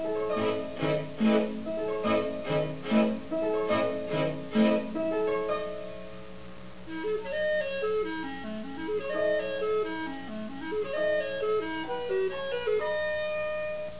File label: clarinet fantasy